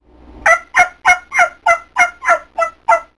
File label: turkey